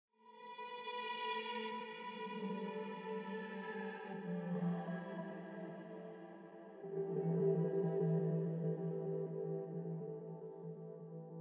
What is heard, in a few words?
Stretching Warping Done using Paulstretch